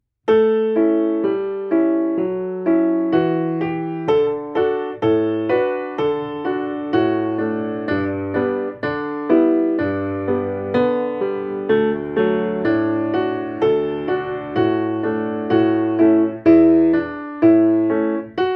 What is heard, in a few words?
Country Ballad 126